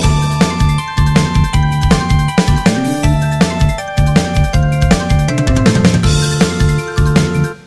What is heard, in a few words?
Htc Ring; Htc Cronot